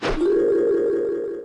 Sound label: Lovely Kiss; Pokemon Essentials; Pokemon Iberia V1.03